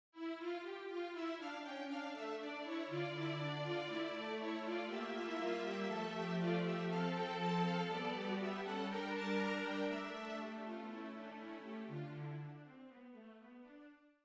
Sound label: art fugue fin